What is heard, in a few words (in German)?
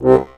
DIATON BASS, AKKORDEON; Best Service; Alpine Volksmusik